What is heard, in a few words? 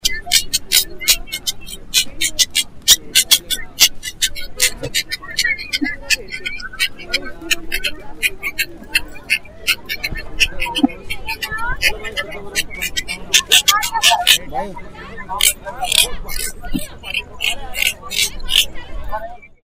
juhu, sounds of mumbai